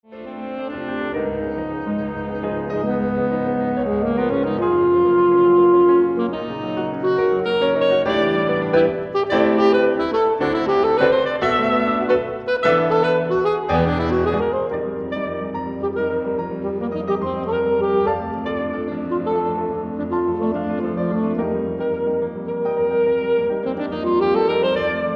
danse sax

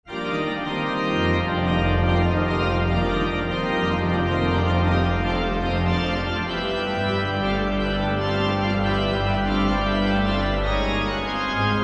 carillon festivo

